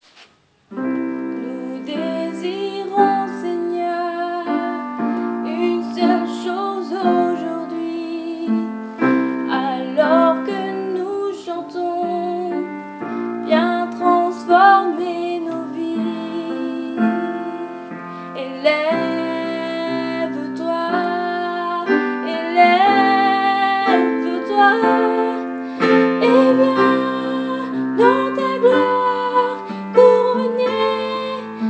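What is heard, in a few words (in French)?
voix soprano en Do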